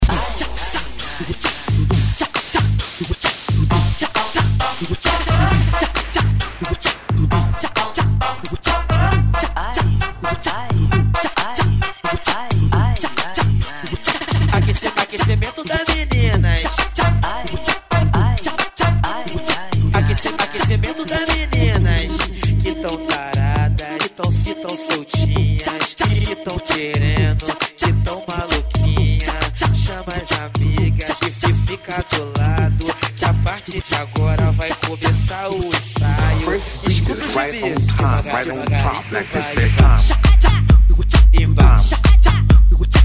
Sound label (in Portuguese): eletro funk; cd balada eletro funk vol4